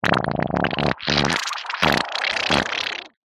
human baby poop wet squelch

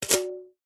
sport tennis racket strings strum fingers; zapsplat

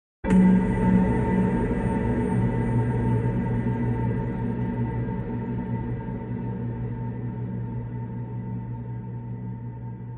horror hit stab thud long reverb suspense

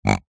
pig grunt designed; zapsplat

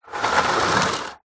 leisure toy lego car push roll carpet

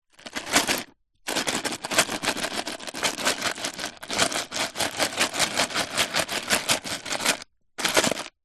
ice in empty paper cup movements fast food; zapsplat

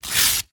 Book Paper Single Page Rip

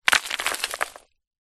rock small roll down pile edge; foley

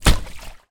inflatable rubber ring land in water hard; zapsplat

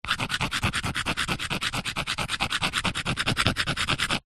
stone small scrapes fast on rock or brick; foley

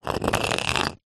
cuttlebone scrape side ground; zapsplat

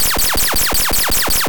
Laser Zaps

